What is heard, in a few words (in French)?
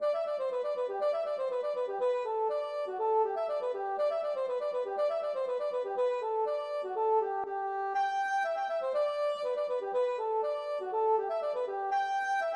polka Norvegienne